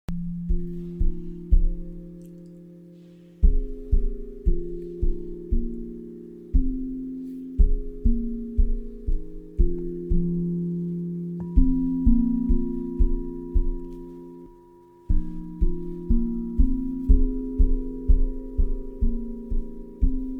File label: Drum and bowls, meditative melody